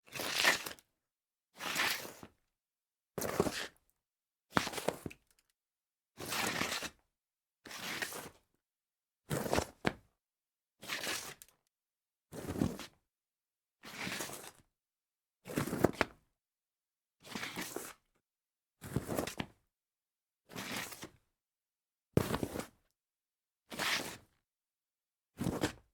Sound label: slide on tile series